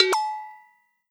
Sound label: exclamation mark agogo